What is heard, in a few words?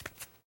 bare step; Golden Crayon Sounds